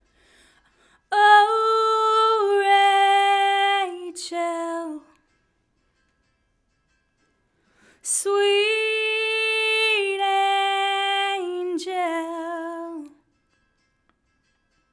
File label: Lead Vox